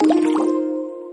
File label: win multiline; Hungry Shark; Wazdan